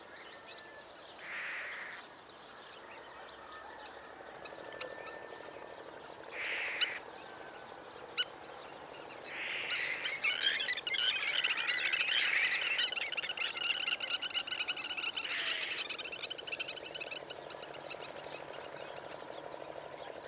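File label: Spur-winged Lapwing, Egypt; sandafah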